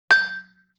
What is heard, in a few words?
Xylophone Clang G; Vintage Cartoon; Musical Elements